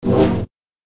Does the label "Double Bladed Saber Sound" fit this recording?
Yes